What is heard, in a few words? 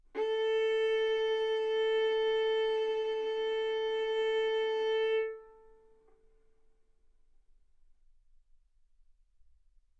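Violin arco mf A string A4 B4 stereo; sul A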